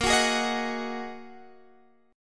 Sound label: Bb 7th Suspended 4th Sharp 5th, Guitar, Drop D